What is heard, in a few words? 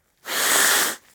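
nose blowing sound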